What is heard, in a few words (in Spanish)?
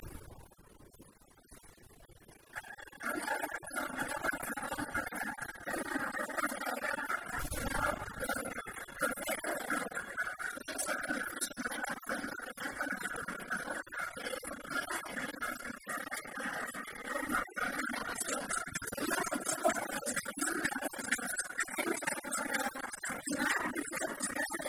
rap